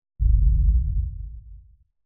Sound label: Explosion, 5.1 Surround; Sony Pictures Sound Effects Series; SUB